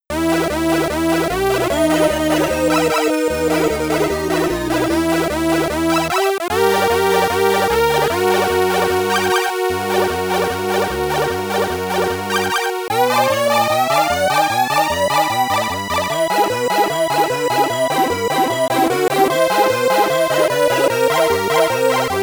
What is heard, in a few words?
Story Sequence